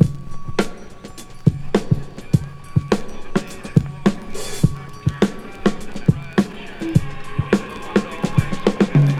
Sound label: 104 bpm fresh drum beat d sharp key